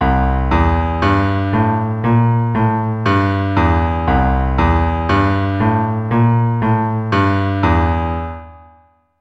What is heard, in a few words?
Walking Bass, keyboard